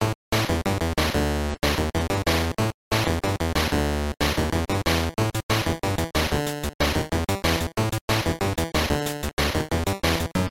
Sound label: Stage; Battletoads & Double Dragon